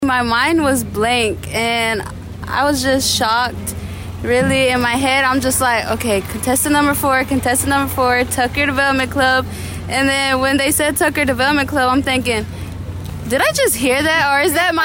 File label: Cadence